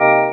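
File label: Talk Box organ "oh"; E-MU Producer Series Vol. 8 – Platinum Phatt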